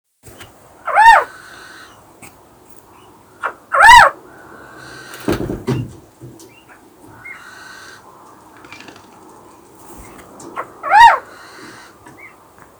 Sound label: Little owl female contact